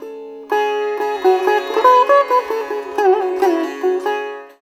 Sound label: SITAR; Spectrasonics; Heart of Asia